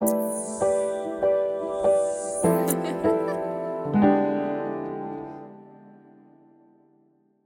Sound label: Melodic Logos Jazzy Piano Woman Laugh